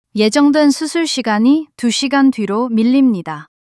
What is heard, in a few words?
surprise